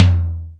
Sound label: DRY T, drum; Crypton; DrumBase5000